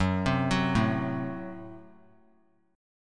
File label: Gb Diminished; arpeggiated; JGuitar